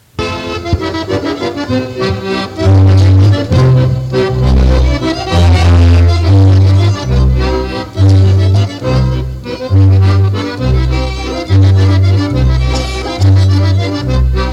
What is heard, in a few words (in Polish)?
Instrumentalny